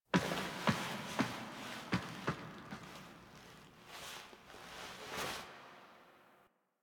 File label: Foley Blast Mirror; The War Within; Operator